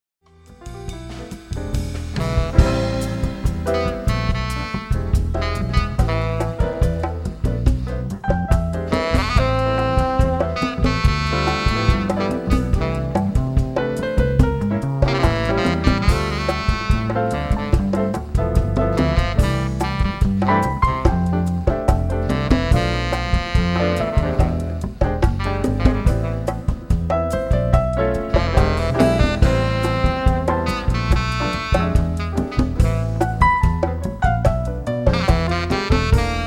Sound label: Retro Jazz Quartet Mix